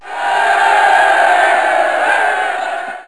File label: cheer